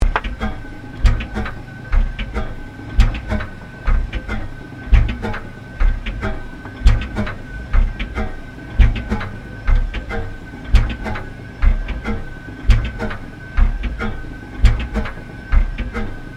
Tractor steam traction engine operating smoke box; Steam Traction Engine 1910; Vehicles

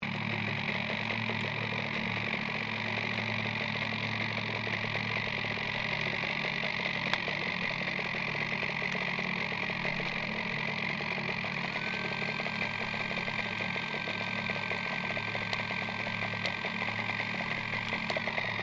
BOAT MOTOR OUTBOARD MERLIN50 IDLE; Various private boats; Vehicles

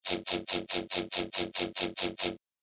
Droid Charging Pulse, robot sound effects; Sci-Fi Robot Sfx; Andrew Fleming

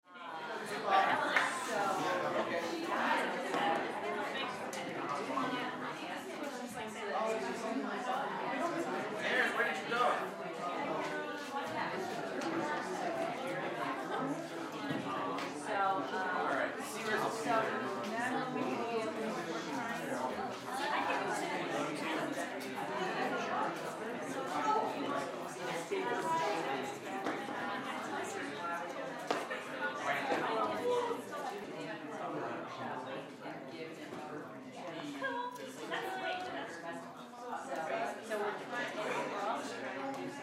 Crowd Walla Classroom Teens Casual Conversation; Crowds; Human; Blastwave

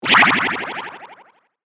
Phaser Gun Stun Ray, Scifi, Science, Fiction; Battle Sound Effects; BlastWave

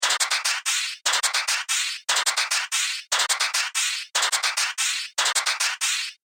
Strange Speeding Up Loop; Science Fiction Sound Effects; Andrew Fleming

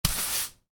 Sweep, Soft Brush; Miscellaneous Household Sound Effects; Miscellaneous Household (Sound Effects:Household); Stuart Duffield

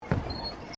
Footsteps Boots Suspension Bridge Wood Wire Step; Footstep Foley; Footsteps (Sound Effects:Human)

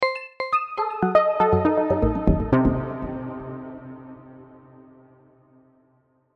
Synth Descend, Synth Descend Mysterious Reverb; Musical; Synths; Blastwave; Sound Effects:Musical

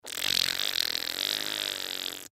Human Raspberry, Raspberry Male Twelve Yrs Boy; Mouth; Blastwave

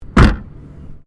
CAR TOYOTA COROLLA HATCH CLOSE, hatchback; 2009 Toyota Corolla hatchback; Cars; Vehicles

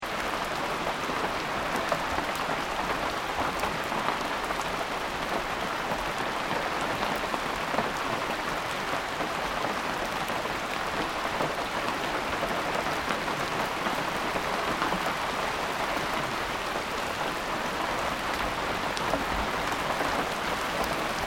Rain Light Dirt Ground Wooden Buildings Loop; Elemental weather effects

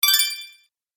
Bright Bonus Win, video game

